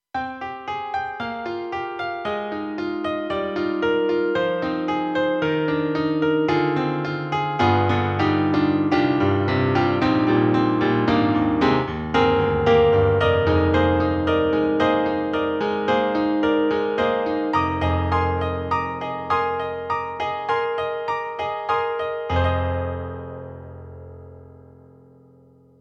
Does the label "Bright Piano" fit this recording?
Yes